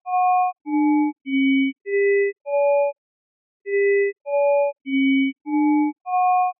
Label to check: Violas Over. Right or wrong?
Wrong